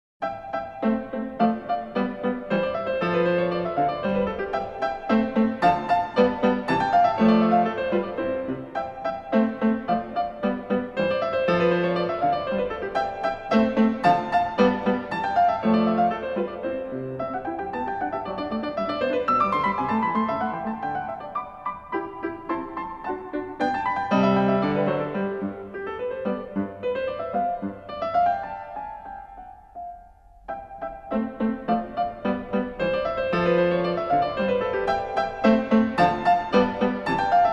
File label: Piano Sonata in C, K545 III; Wolfgang Amadeus Mozart; Piano Concertos Nos 20 & 24